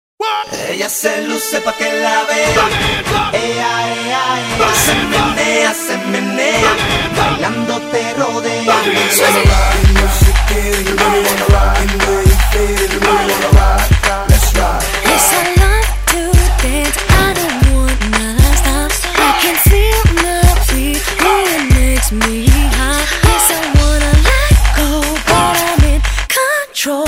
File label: Ringtone